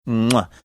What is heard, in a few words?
making chefs kiss sound